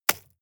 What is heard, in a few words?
drop stones small